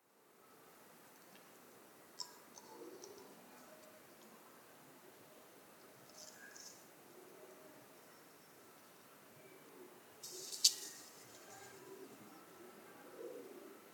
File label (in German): Silberreiher Casmerodius albus einfliegend Schlafplatz; 18 einfliegend Schlafplatz; Dachau; mild 10Grad; Vogelstimmen